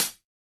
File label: hihat, house